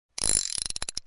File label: gun spin; RussianRoulette; PainJam